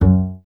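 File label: string contrabass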